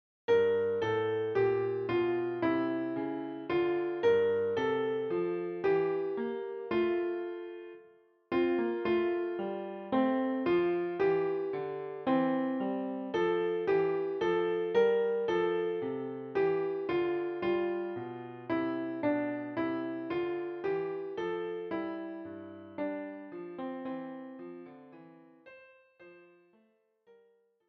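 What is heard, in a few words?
O come all ye faithful, Klavier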